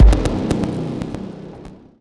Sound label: weapon; svencoop; rngremake; items